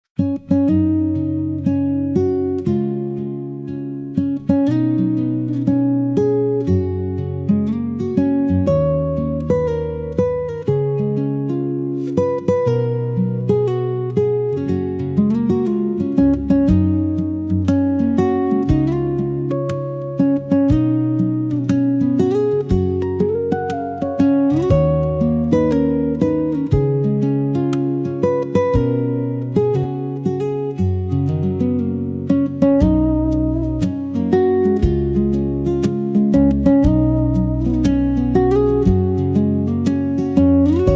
Happy Birthday Guitar Melody, instrumental Version